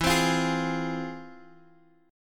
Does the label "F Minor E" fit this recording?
Yes